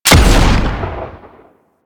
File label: Thumper Bot Rocket Shot B; Warframe; Grineer